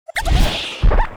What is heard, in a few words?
ATTACK; Chooty; Mob